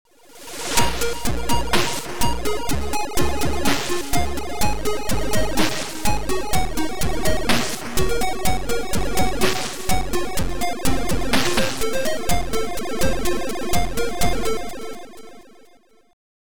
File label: Game over; nq; Vradark s Sphere